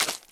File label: gravel step; Golden Crayon Sounds